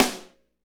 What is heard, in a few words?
snare, R&B Snares, Roland; Northstar - Drumscapes Roland